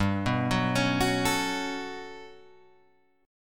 G Major Sharp 11th G down Guitar Open G

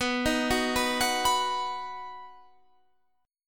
B-Major B down Guitar Standard; arpeggiated; JGuitar

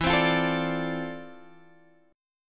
D-Minor 7th E, chord; Dm7/E; strummed

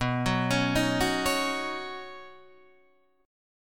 B Major Add 9th B Guitar Open D

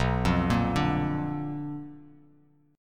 B Minor Major 7th Flat 5th Bass Standard; arpeggiated; JGuitar

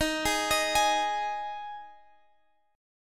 G sharp 5th D sharp

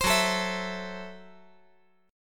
Gb Minor 7th Flat 5th

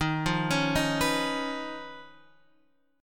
D sharp 7th Suspended 2nd Sharp 5th; arpeggiated; JGuitar

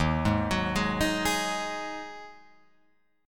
Eb Minor Double Flat 5th Eb down Guitar Open F; Ebmbb5 arpeggiated; JGuitar

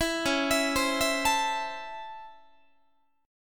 Db Minor Major 7th Sharp 5th, Guitar; 4A,4E,5A,5D,5G#,6C#; arpeggiated

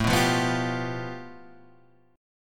A 7th Suspended 2nd Sharp 5th

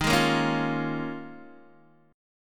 D sharp 9th Suspended 4th D sharp, Guitar DADGAD

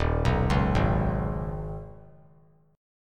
Eb 7th Suspended 4th Sharp 5th; Eb7sus4#5; arpeggiated; 3,6,4,6; JGuitar

